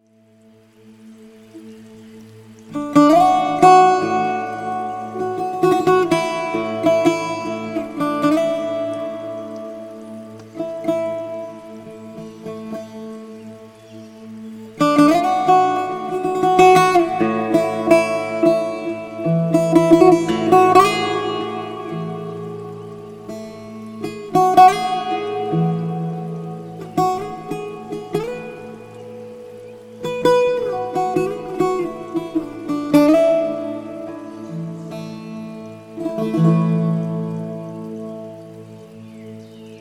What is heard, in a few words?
Bouzouki